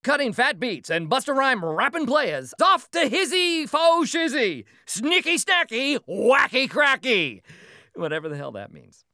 Cutting Phat Beats; Outlaw Volleyball

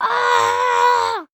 Child Scream; Voices; Don't Be Afraid The First Toy

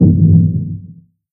Underwater Explosion

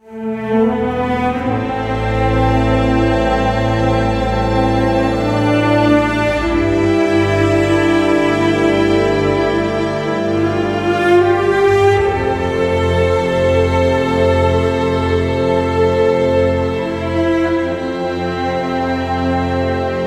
Sad Loop

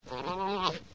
Llama Boy Idle

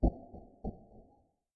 footsteps; Five Nights at Treasure Island 2020 Remake

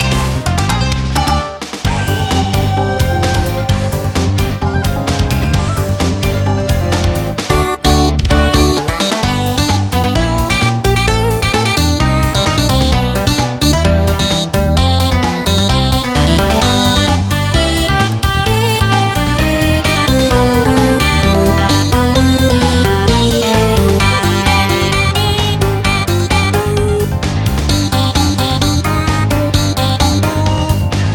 Blue Streamer Battle; Friday Night Funkin': The Origami King; iOS, Linux, MacOS, Online, Windows

